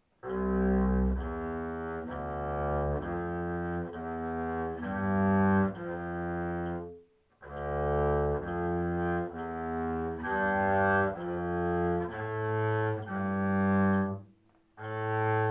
contrebasse

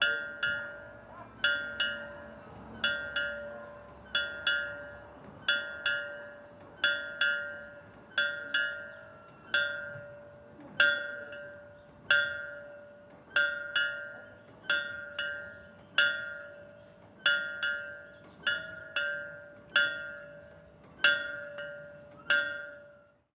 cloche western city

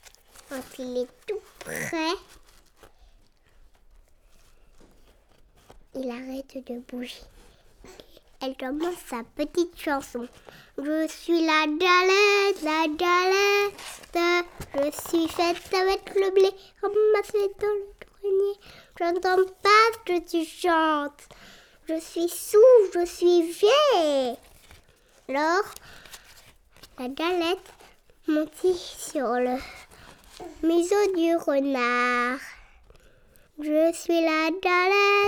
galette

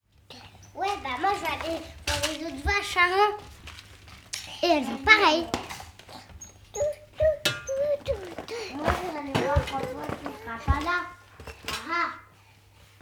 marche

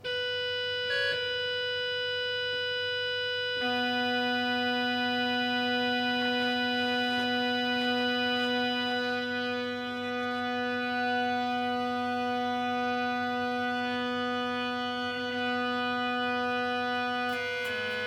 accordage

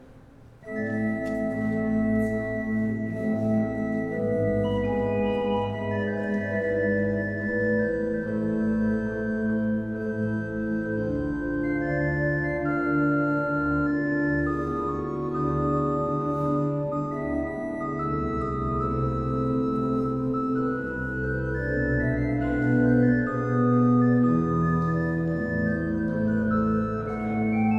orgue caen

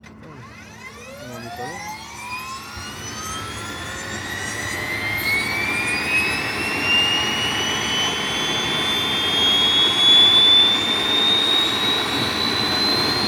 helico tigre

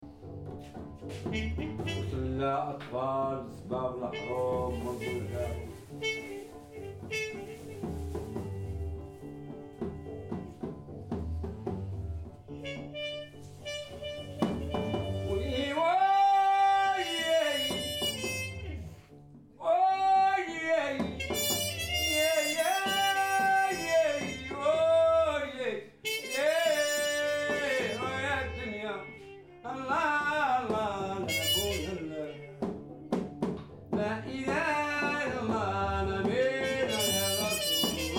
cuisine trompette

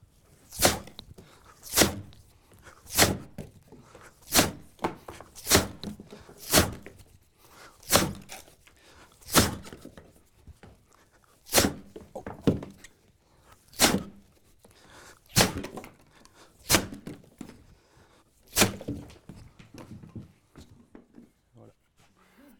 couper du bois

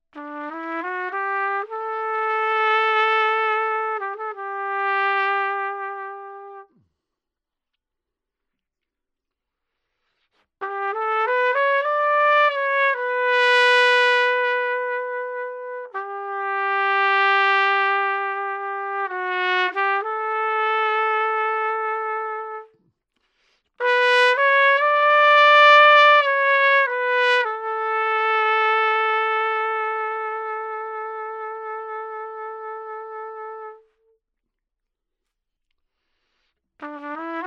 trompette

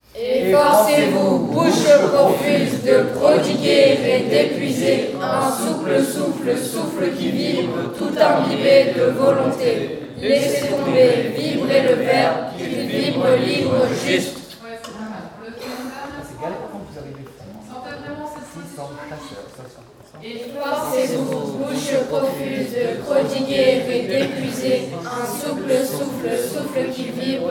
bouches profuses, en échauffement

